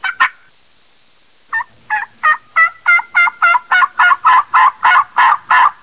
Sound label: Turkey Call